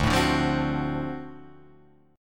D 7th Sharp 9th D; D7#9 strummed; JGuitar

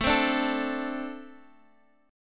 A Minor Double Flat 5th, down, Guitar, Open D

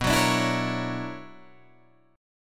B-Minor Major 11th B down Guitar Drop D

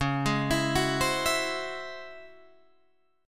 Db Minor Major 11th, Guitar, Drop D; arpeggiated

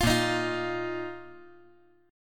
A sharp Suspended 4th

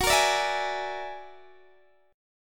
F sharp Augmented 9th, Guitar, Standard; F#+9 strummed; x,21,20,19,17,16; F#+9 Chord

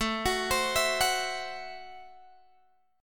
A Minor 6th A, Guitar, Open D

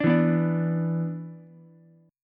B-Minor Double Flat 5th, Ukulele; Bmbb5 strummed; JGuitar

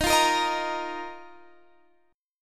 A sharp Suspended 4th D sharp, guitar chord

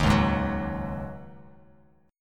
Db Suspended 2nd Suspended 4th Db Bass Standard; strummed; JGuitar

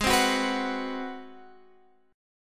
Ab 7th Sharp 9th, Guitar, Open F; strummed

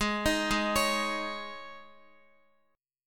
Ab Suspended 4th, Guitar; Absus4 arpeggiated; x,x,6,8,8,8; JGuitar

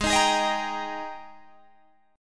Ab Major 7th Suspended 2nd Ab Guitar Drop D; 18,18,18,20,20,18

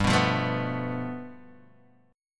G 9th, Guitar Open D

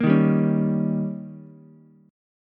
D sharp 7th D sharp, Ukulele; strummed; JGuitar

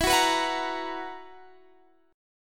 B Major 7th Suspended 4th, guitar chord

